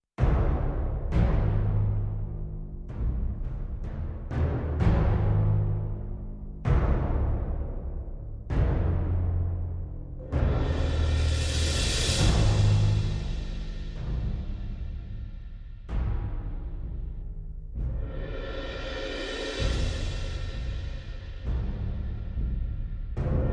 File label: Percussion Only